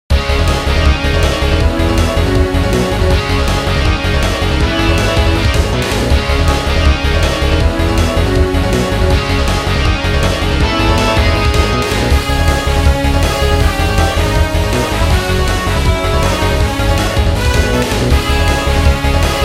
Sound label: battle music